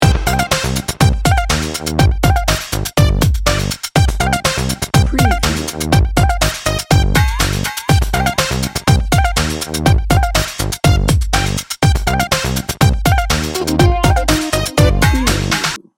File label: bouncy and bright loop